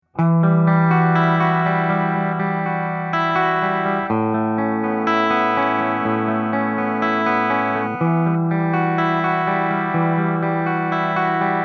Clean Bridge

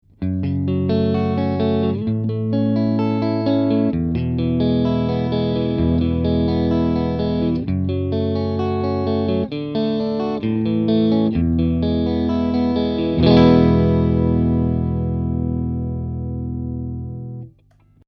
Strat Am Pro arpegio medio mastil

